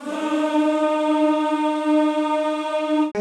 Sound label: CHO, Roland; Sound & Vision; Gigapack I CD 2; CHO Zzzz + Vvvv; CHO Vvvvv